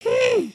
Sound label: raincloud; emotes